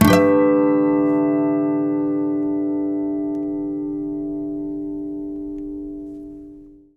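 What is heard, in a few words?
Nylon Guitar harmonics; E-MU Producer Series Vol. 8 – Platinum Phatt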